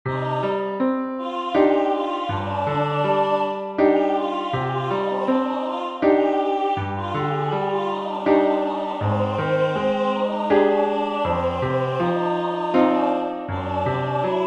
entonacion piano; Entoación con acompañamento

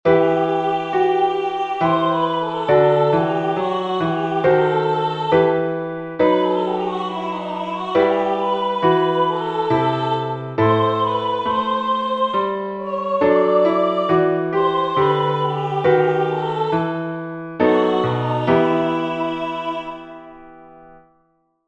entonacion piano

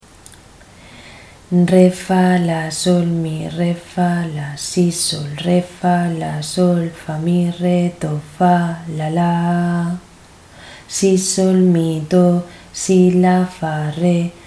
ritmo 6-4

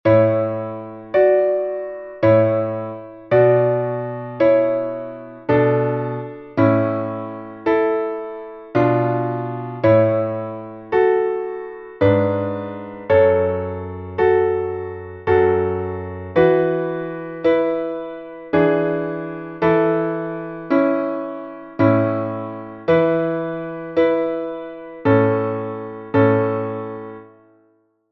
entonacion piano

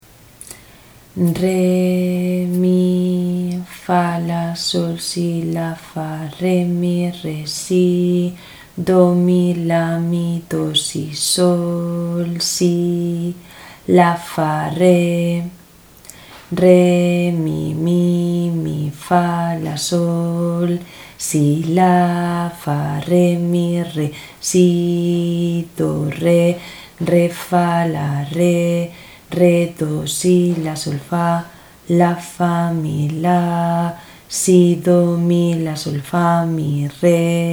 ritmo 6-4

